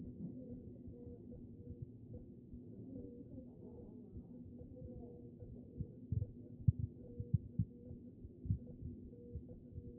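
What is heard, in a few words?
anterior chest, position 4; B MODE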